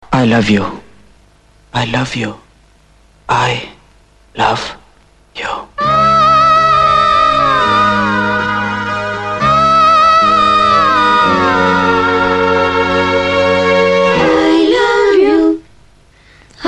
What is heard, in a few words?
heart touch tone